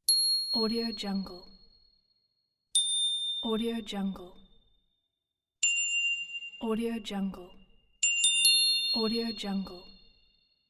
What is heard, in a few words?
bell ding